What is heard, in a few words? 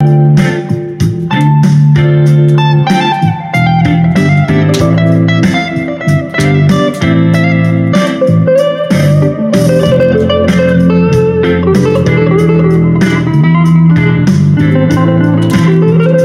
Funk Jazz Blues